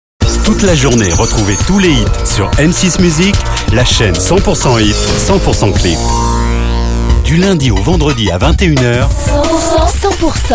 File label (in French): music